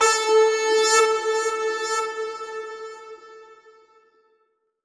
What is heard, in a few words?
ethno, sound ethno